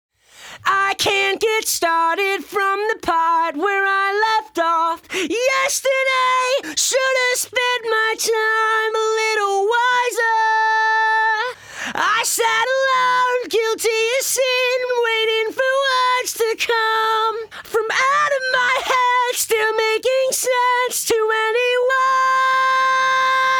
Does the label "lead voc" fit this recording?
Yes